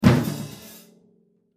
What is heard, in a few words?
Drums End